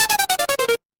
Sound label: retro game game over